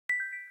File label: Small bell, notifications; Tecno Phantom Pad II (G9)